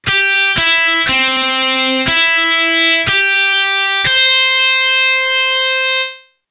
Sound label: 6 klang distortion; echo